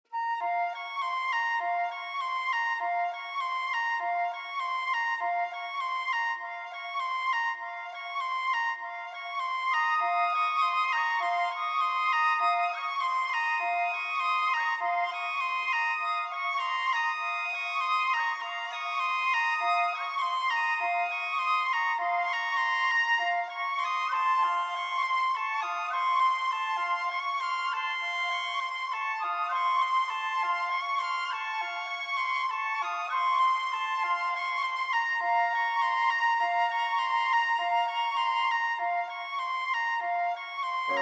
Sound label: Ambient Echoes